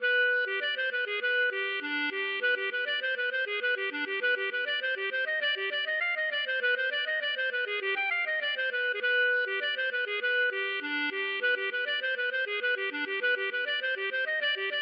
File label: klezmer